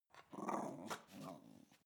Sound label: dog snarl playful